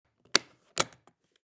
Opening a metal briefcase

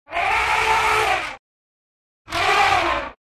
Elephant trumpet

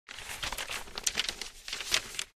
Wax paper handle movement

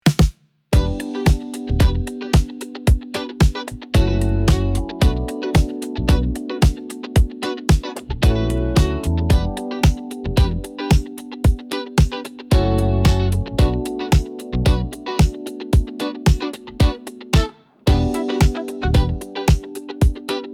Funky groove light groove machine

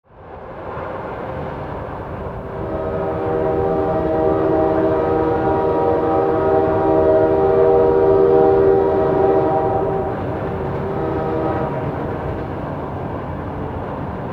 Train horn blast distant multiple; vehicle

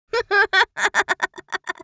Young girl funny laughs

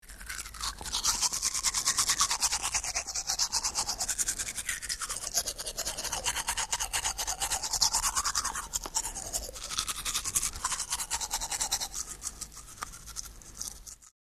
Human brushing teeth close perspective

